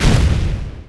shield; NanoFXv2; ExplosionFX; ExpShield

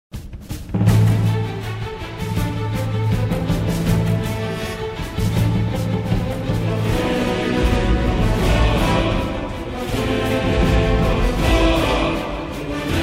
Transition

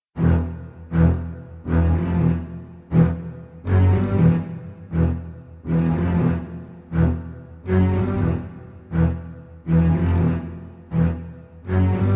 Combat Music; DS9FX

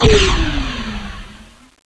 klingon torpedo

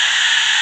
Poleron

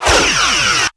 Phaser; Weapons; CADS9

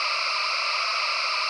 Burst, Weapons

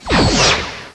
Klingon Torp

